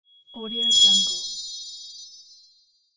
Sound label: shine